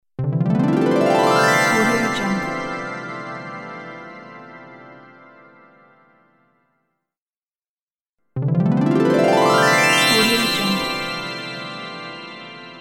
magic glissando bell

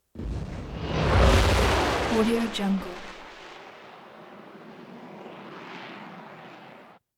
spaceship pass by blast off roar hiss fast

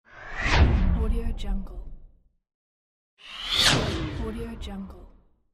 a whoosh

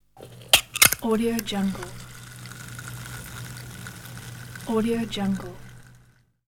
opening a beer can

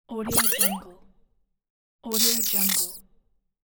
a fun positive transition

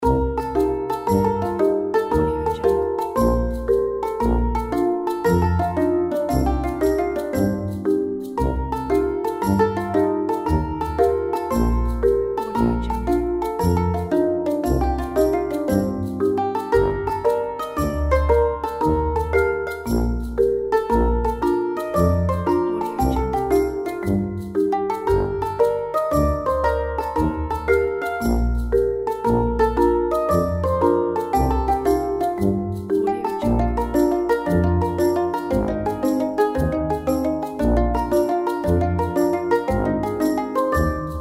silly comedy; vaanfomm

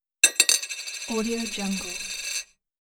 coin droping; alexsandal

